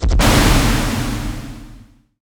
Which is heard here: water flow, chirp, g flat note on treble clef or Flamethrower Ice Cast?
Flamethrower Ice Cast